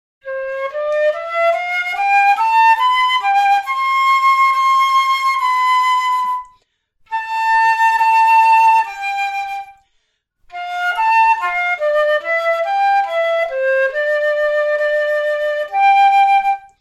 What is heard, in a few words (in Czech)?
Pricna Fletna Sopran Fletna